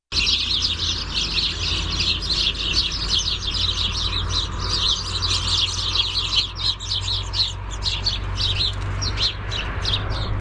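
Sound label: cheep, House Sparrow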